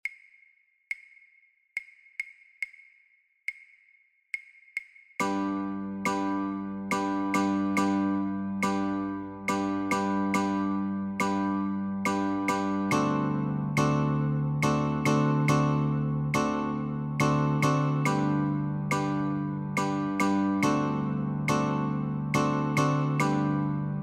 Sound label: Chords, guitar